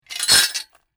Metal Chain Drop On Ground; Part of the LYN104 package of Chain sounds; Metal Sound Effects

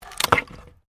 WOOD PLANK TWIST

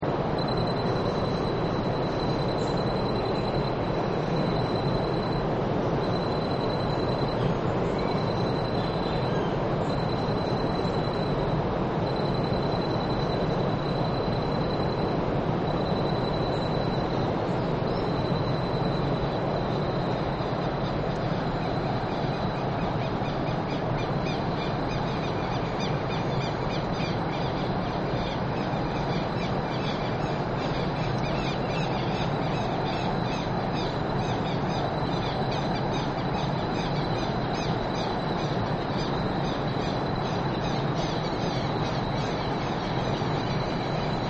Iguassu Falls Jungle, Nature Scenes; Environmental; Partners In Rhyme

